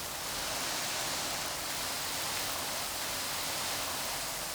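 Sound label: sand loop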